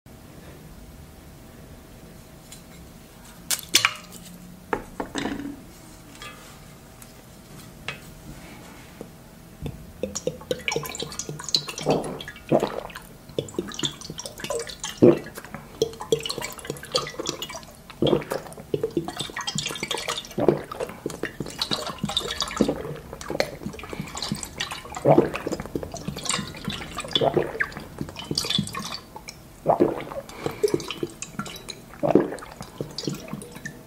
ASMR; ای اس ام ار

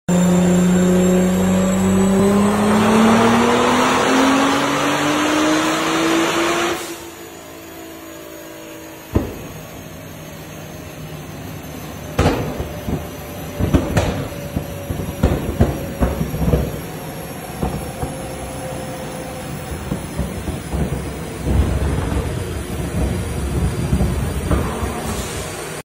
nissan gt r; اگزوز

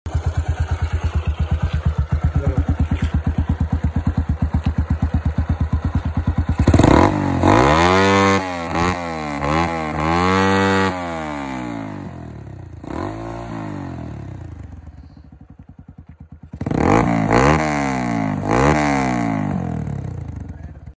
motorcycle; موتور مگلی

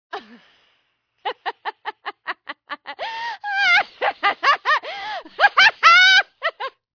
Laughing Girl

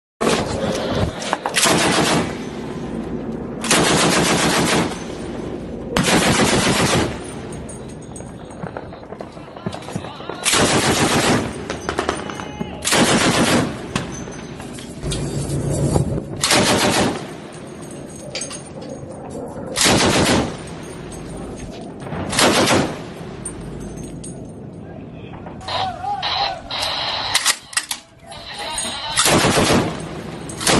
New Anti Aircraft gun

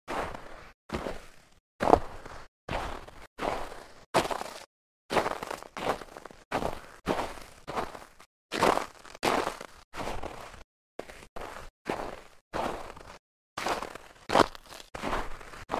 walking in snow; راه رفتن در برف

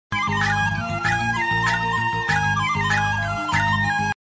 Chinese Ringtone

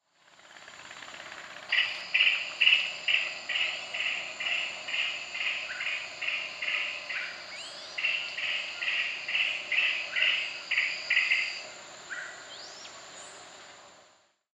Green aracari

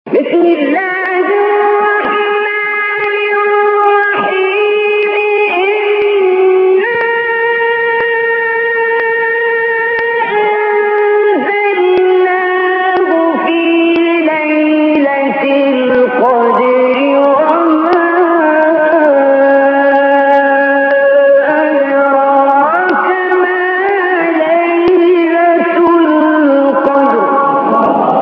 quran

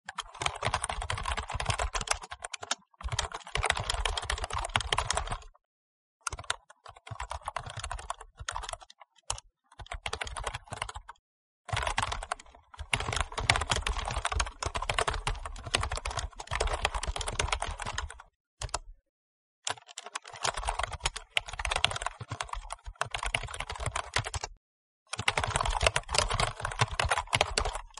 Keyboard Typing

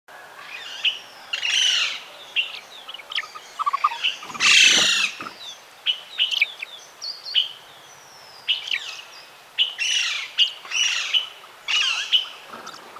Black crested coquette

